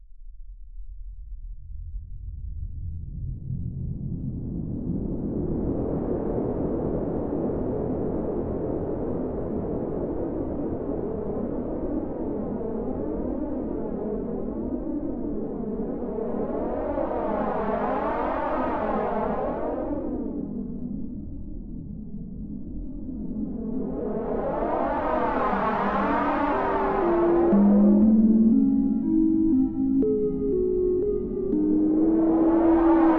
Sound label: ambience